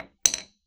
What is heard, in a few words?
Hammer Falling; Undertaker's 2017 gamerip PC